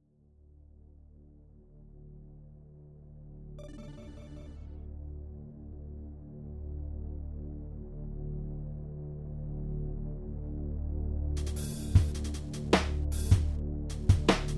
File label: Underwater Lab Tune; GameOST